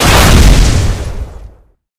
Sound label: rocket explode, weapons; blackbox; tf